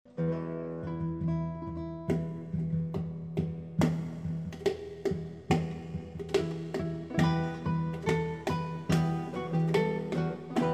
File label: drums; Pat-a-pan